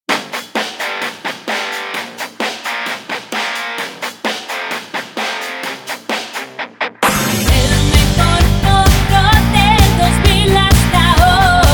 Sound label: rock